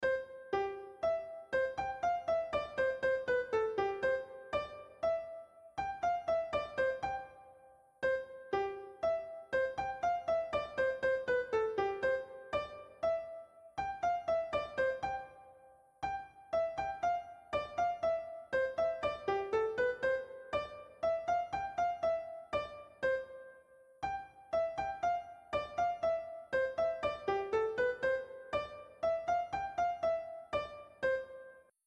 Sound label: spain anthem kalimba; Spain National Anthem Marcha Real